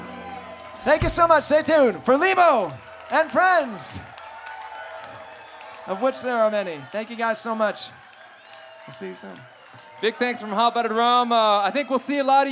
thanks, announcer and applause; Bluegrass

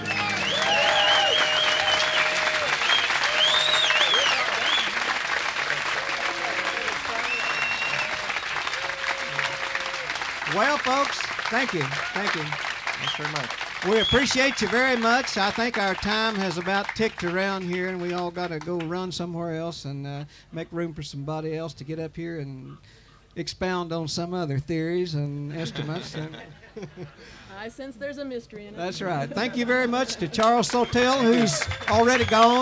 guitar; Bluegrass; Winterhawk Bluegrass Festival; Winterhawk 1993; WorkShops

